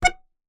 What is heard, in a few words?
Melodica Short Note